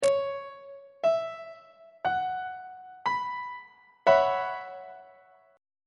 f sharp dominant 7th suspended 4th 2nd inversion; on treble clef

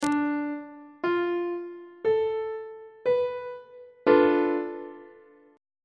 d minor 6th chord on treble clef

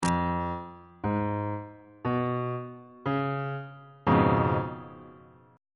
c sharp dominant 7th 1st inversion on bass clef

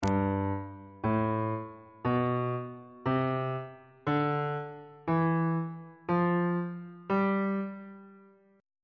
mixolydian mode on bass clef

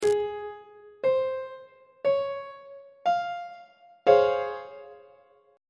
c sharp major 7th 2nd inversion on treble clef

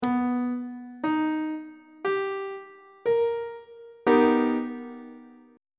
c flat augmented major 7th chord; on treble clef

